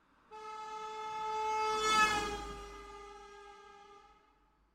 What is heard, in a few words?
doppler sound